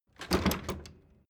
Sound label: door flap loft impact